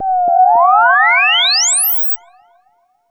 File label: planet